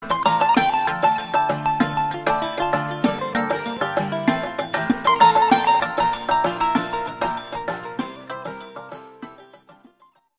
Greek Melody

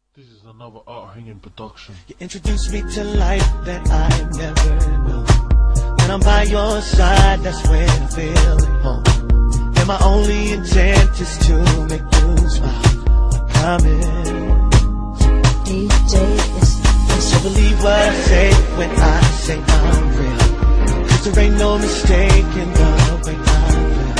reggae remix

